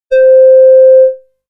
Solar Flute C

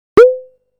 Wasp-Drum

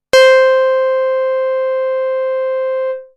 Basic Pulse, synthlead

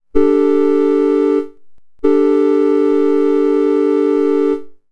Train Horn

